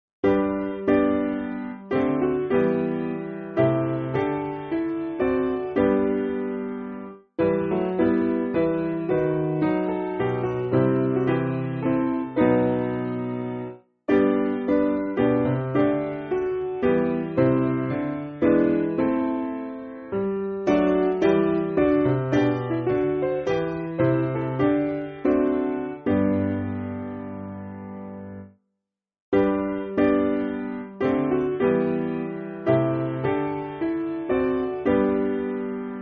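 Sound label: Piano, Music Backing Files for Churches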